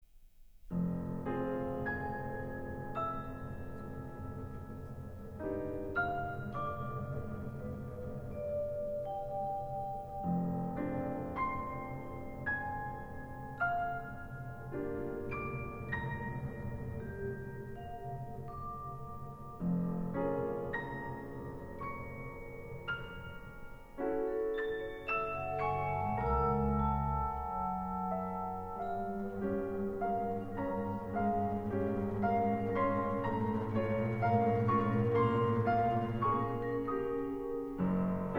Concerto For Trumpet Wind Ensemble, Andante Expressivo